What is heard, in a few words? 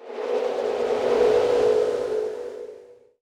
alt run arc; windrunner